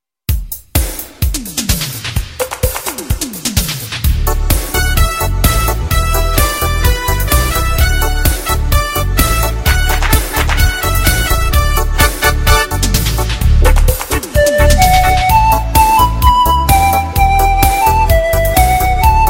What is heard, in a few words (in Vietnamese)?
nang som beat